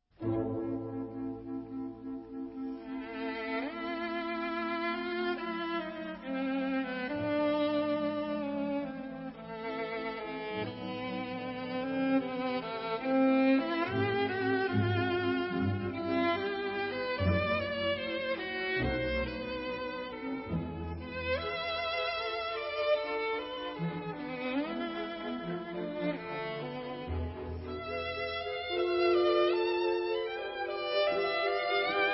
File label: Violin Concerto In A; Glazounov